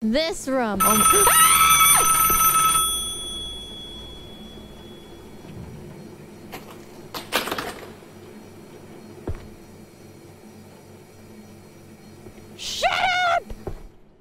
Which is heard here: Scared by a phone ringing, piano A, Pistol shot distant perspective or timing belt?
Scared by a phone ringing